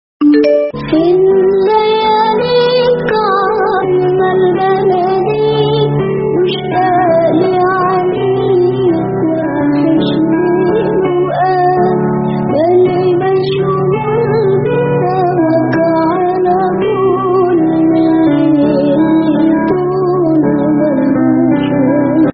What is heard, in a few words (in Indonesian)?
Nada Dering Islami